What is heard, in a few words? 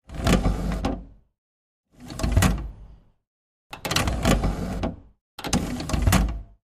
Door Motorized Fst Ope, electrical